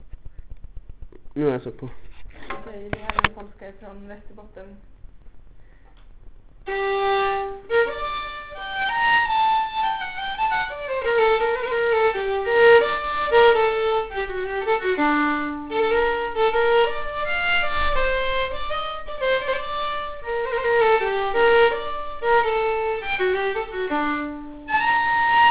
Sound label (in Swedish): Polska Vasterbotten